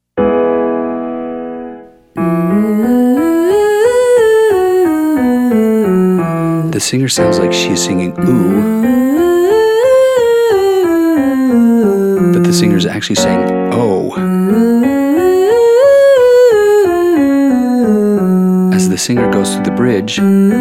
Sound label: Exercise tongue roll female